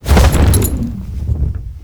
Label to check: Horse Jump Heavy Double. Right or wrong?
Right